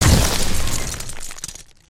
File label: earth smash; destructibles